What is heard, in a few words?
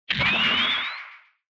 Retro Anime Accent Short